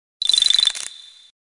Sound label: Collect Ice Egg; Banjo-Tooie